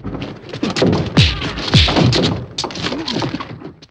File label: fight punch face